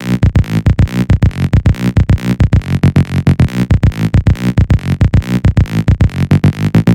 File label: 138 BPM Bassline, B minor